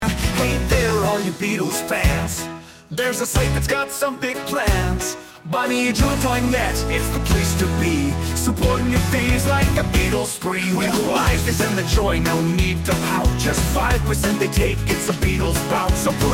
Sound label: 50s 60s pop band